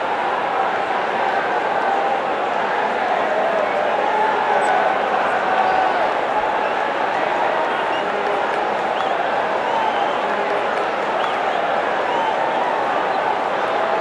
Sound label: public; Mafie 1